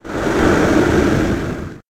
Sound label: Earth Element Magic Spell